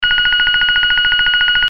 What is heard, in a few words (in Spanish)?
sonido electronico